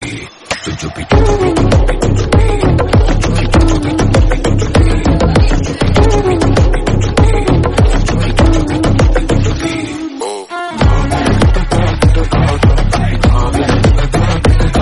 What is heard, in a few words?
mass music; jailer; superstar rajinikanth